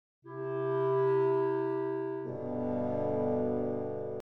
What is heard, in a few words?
woodwind